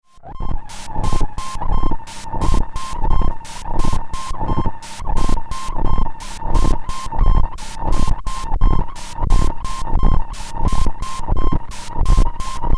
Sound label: acid machine